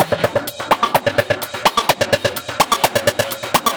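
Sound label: Percussion, Drum Loops 127bpm; Planet Emergency; Nu Dance Electro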